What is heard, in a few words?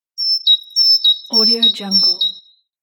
bird